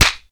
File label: slap; Crash Bang Boom